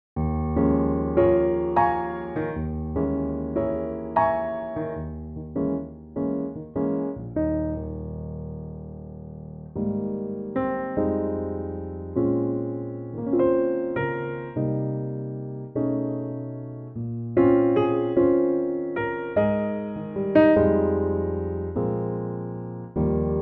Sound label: Ab piano